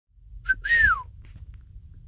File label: Whistle